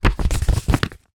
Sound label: foley photograph handling; zapsplat